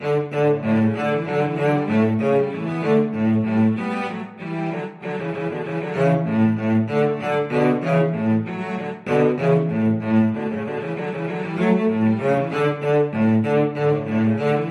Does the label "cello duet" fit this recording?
Yes